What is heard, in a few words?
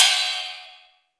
NOISE CRASH; DANC2